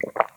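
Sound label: drink swallow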